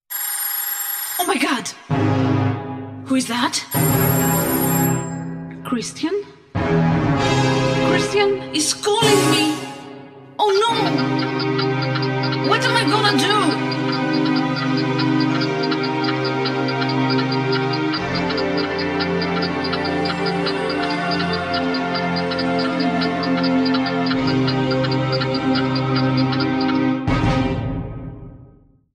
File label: christian is calling suspense ringtone